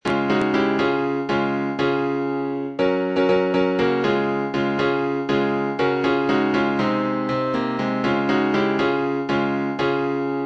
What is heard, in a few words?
Gods Choir, instrumentals